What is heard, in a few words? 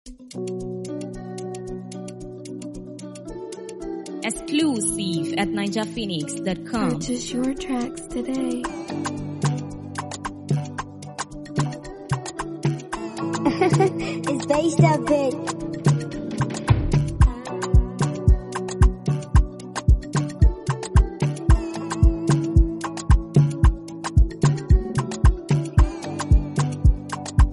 Free Beat